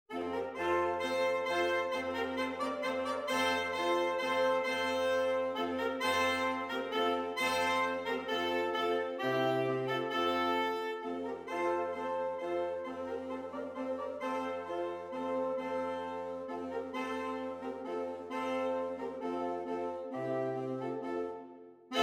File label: Fanfare, Sax Quartets; Easy Collection of Sax Quartets